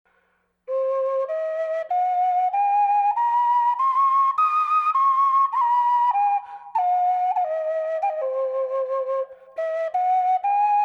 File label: Cm Back Pack Flute wet; flutes; Laughing Crow